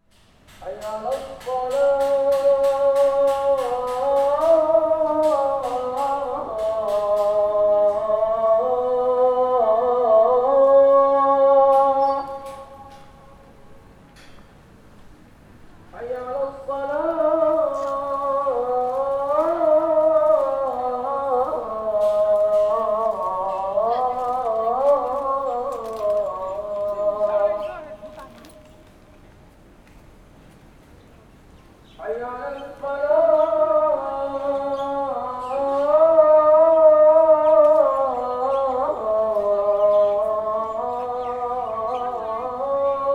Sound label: Sultan Mosque Muezzin Call, Singapore; Les Cartes Postales Sonores; NOISE ON EARTH Vol4